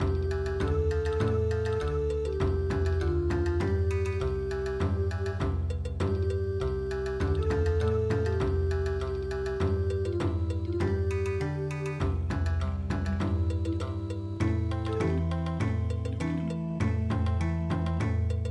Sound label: Bon Odori